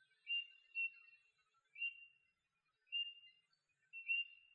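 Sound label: uso call, Eurasian bullfinch; jinaki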